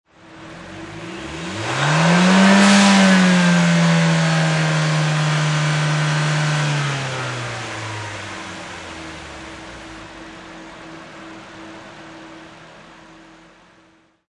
Nissan Silvia Engine Long Rev; Auto, Power Car, High Performance Car Sound; Engine perspective. Motor rev, engine revving; Car Sound Effects

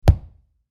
Impact Hit Solid; Punch And Hit Sound Effects; Punches and Hits (Sound Effects:Combat); SFX Bible 6

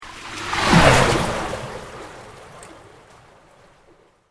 Sweep Organic Slow; Created sweeps, swooshes and other movement effects; Sweepers; Sound Design; SoundEffects-Foundation4

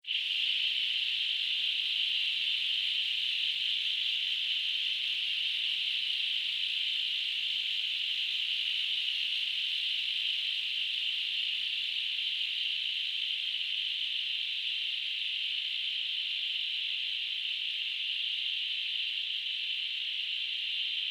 Pneumatic Release, Scifi; Hiss, Long, Science, Fiction; Spacecraft; BlastWave

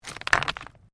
STONES MOVE; Material components for constructing more complex sounds; Rocks; Materials

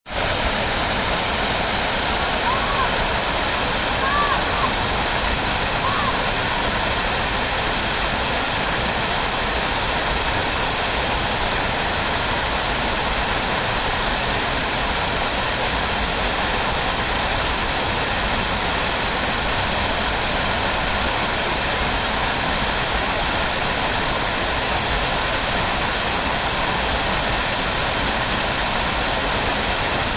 Pool waterfall with distant crowd noises.; Pools

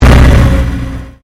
Convolution Pulse

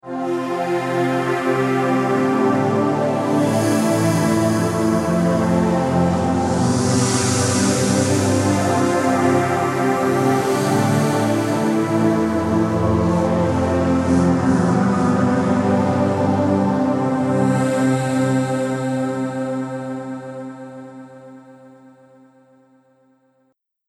Audio Logo Music; Musical Sound Effects

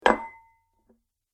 Dessert Bowl Hits Table Surface; Dishware Sound Effects; Household Sound Effect; Andrew Fleming

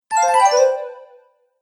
Magical Message Notification; Musical

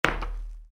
Soccer Boots: Individual Footstep On Concrete; Footstep football boot; Footsteps; Human; Stuart Duffield

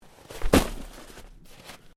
Body Movement Snow Drop; Body movement foley to simulate falls and deaths; Drops (Sound Effects:Objects)

